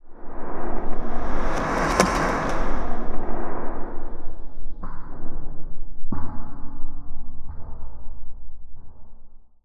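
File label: Reverse Boom Reflex, Cinematic Metal Impacts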